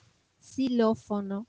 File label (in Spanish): xilofono